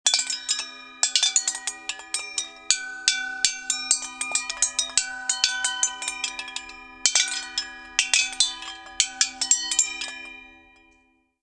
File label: bell chimes